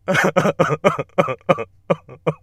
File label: sadness